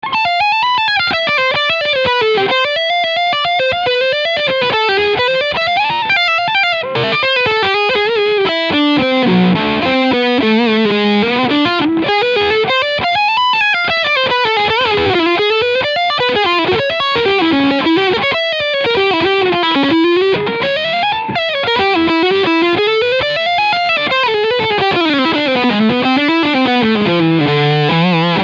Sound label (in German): 7 String Leadsound Steg Pickup; Dean Guitars Exile Select